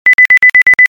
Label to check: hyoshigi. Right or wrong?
Wrong